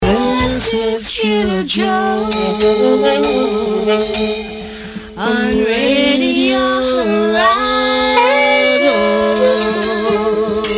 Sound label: Jingle; Horizon Radio Jingles 1981 to 1985